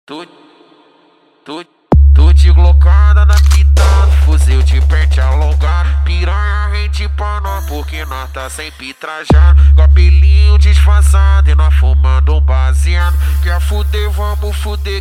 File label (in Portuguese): mega funk